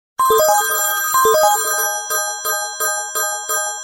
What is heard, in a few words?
New Style Sms, SMS Tone